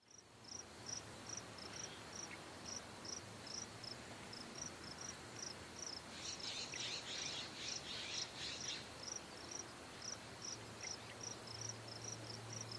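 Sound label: Mixed Insect Ambience, cicadas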